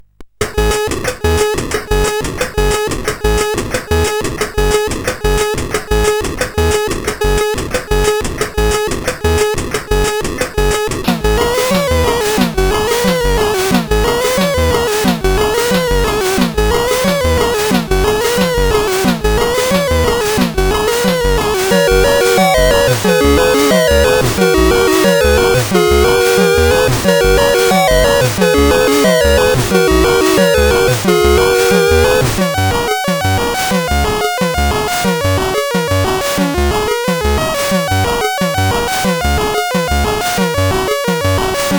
High Energy, CSG8580R5; sid

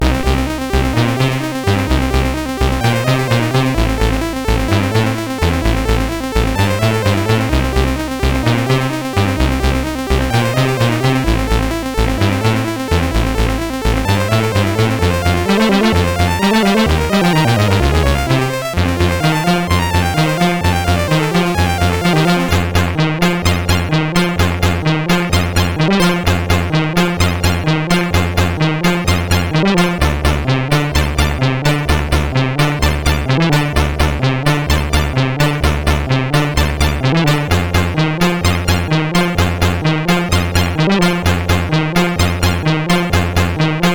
disk version CSG8580R5; sid

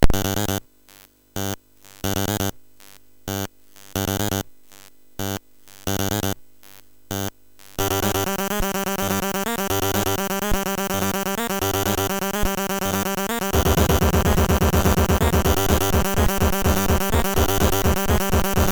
Fast Techno, MOS6581R3

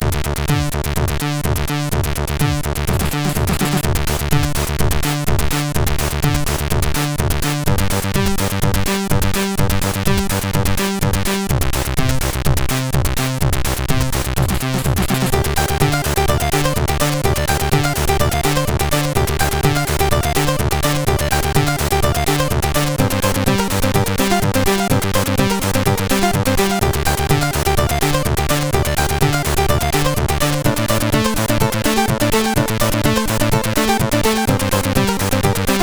Techno Pupi, sid MOS6581R3

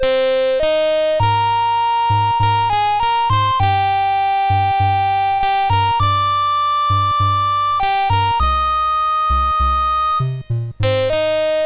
CSG8580R5

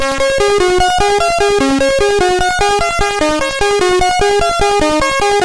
Guitar, CSG8580R5; sid

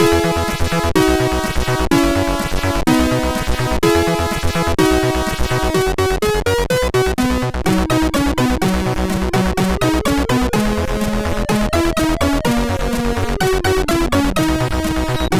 Jingle Bells, MOS6581R3

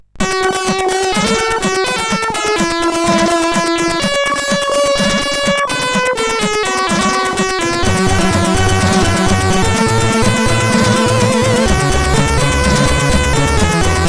Cool Intro, CSG8580R5

